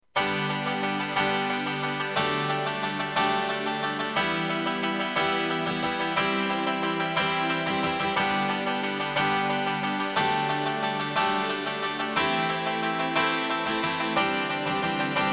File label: Loss mer Singe Playback